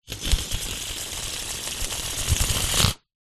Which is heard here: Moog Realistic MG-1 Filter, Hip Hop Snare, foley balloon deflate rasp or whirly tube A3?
foley balloon deflate rasp